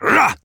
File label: grunt attack